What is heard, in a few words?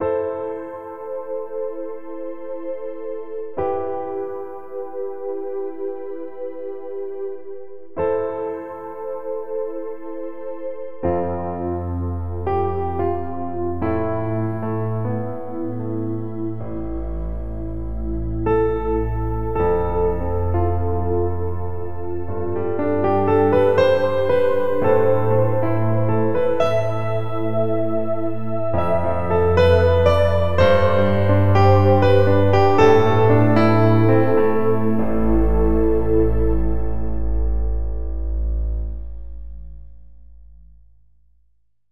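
Magic Piano, synth; KORG OASYS; oasys assault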